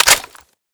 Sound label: M1921 mag out, tommygun; svencoop; hunger; weapons